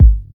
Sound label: 00s rap steel kick drum sample g sharp key